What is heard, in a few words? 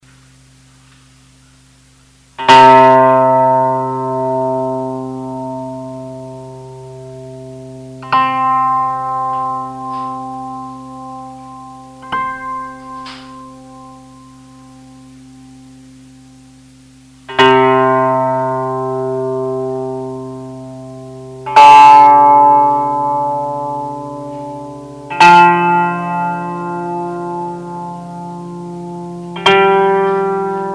Hammer, Bernoulli Involute Sounds; Using a Dulcimer Hammer